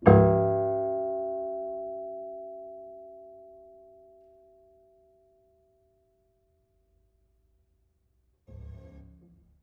Piano Harmonics, Harm Low Mid

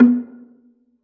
Gambang Soft Beater, Gamelan

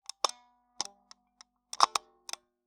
Taxi Meter

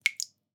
Drips

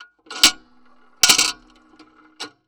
PayPhone

